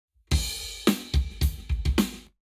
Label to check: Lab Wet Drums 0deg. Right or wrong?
Right